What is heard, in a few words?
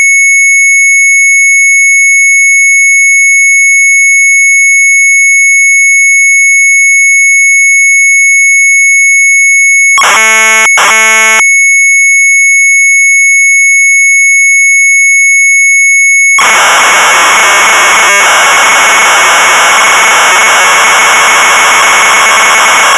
MZ800 Tape; mz800 wav; ROMIEN DEC; Sharp